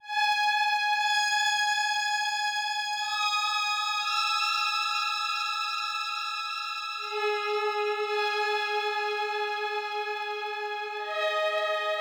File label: Huge sci fi lead; vostok inc. switch gamerip 2017